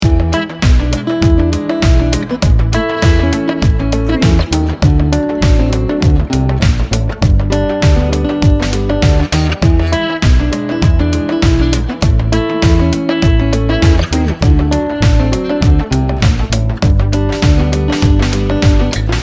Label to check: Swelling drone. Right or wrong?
Wrong